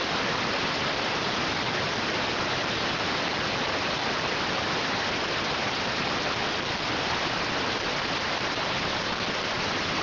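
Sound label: radioteletype receive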